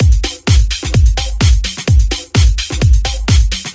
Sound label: House Beat, 128 BPM